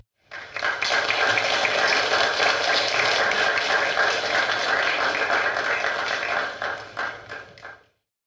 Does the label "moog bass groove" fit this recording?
No